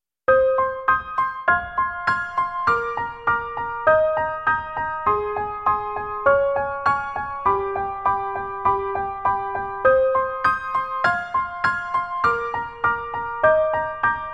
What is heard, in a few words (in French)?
marche; marche (harmonique)